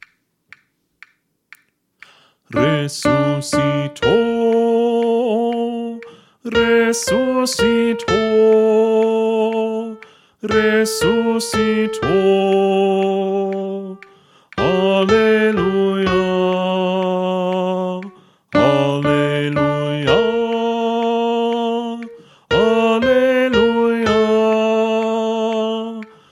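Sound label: Refrain Melody, Choir Practice Tracks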